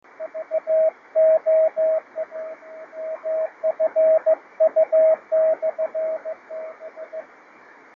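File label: 50.073 MHz, ham radio beacon; VO1FU/b Newfoundland and Labrador, Canada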